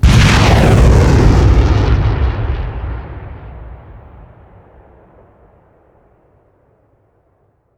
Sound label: trail, rocket; gmod; arccw uc commons attachments